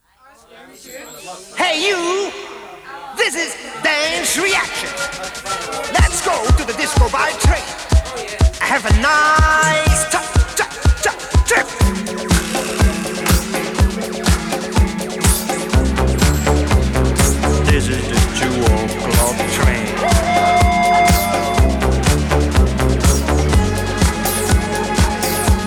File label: 12'' Version